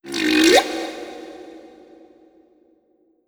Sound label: Critter Female Verb; Kahlo